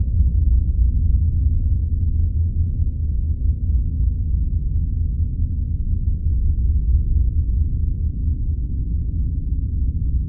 Drone Science Fiction Power Source Constant Steady; Production Element Imaging; AdobeCC